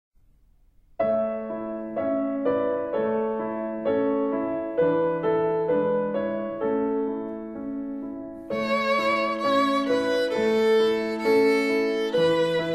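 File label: Go Tell Aunt Rhody Slow Practice, Violin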